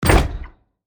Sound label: Fight combat game menu ui tab switch